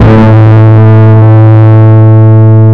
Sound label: bass E; Zero-G; Chemical Beats; BASSES